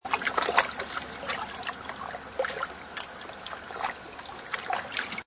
Water Lapping Short, Close recording of very small waves lapping up against stony b; Water lapping: Close recording of very small waves lapping up against stony beach. Seamlessly looping sound, short version.; Lake Sound Effects